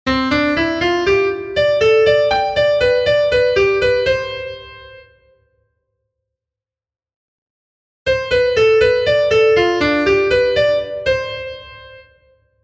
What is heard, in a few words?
major251